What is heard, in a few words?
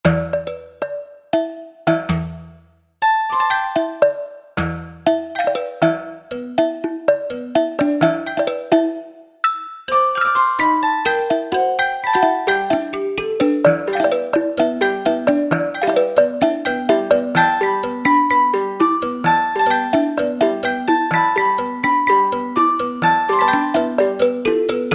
Prepared Mbira